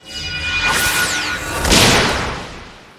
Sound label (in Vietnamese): Am thanh trung don tan cong ma thuat